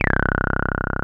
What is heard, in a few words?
TB 303